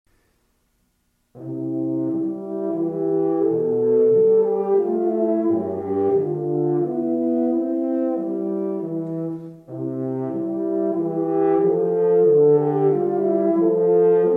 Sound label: Lullaby